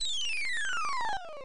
Falling; Pushover

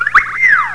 spin; Sexy Games 3

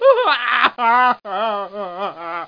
cry

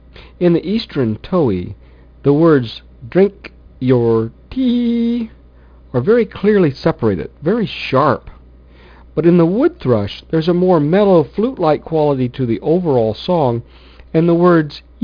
thrush; NatureWare: North American Birds - Sight & Sound

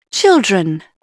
children; Dorling Kindersley My First Incredible Amazing Dictionary

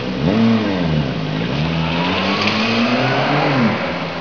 car move; Story Maker

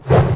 sword thrust

